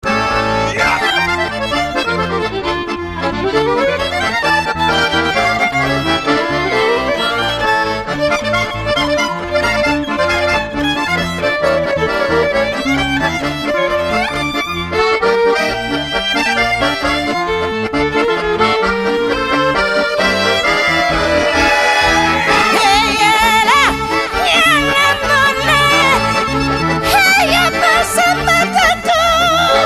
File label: klezmer n gipsy